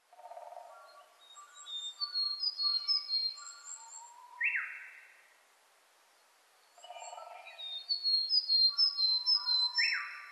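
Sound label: willow tit; kogara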